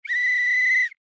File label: referee whistle